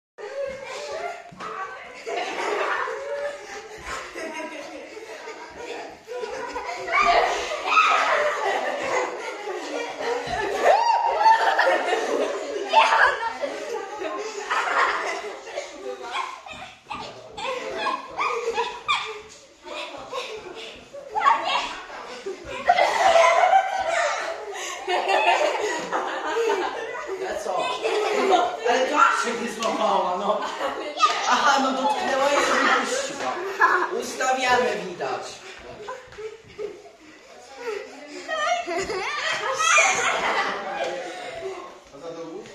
Children having fun

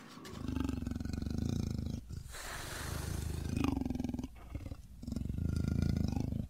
Leopard

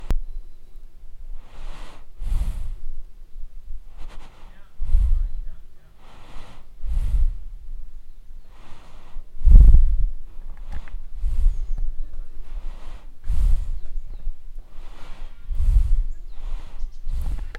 Horse breathing

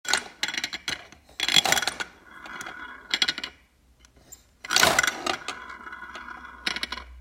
Roulette

